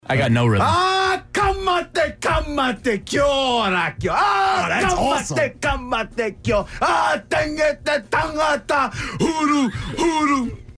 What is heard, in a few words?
Haka War Chant